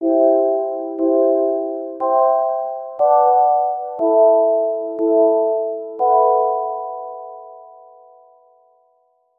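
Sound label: create a 3 note progression, chimes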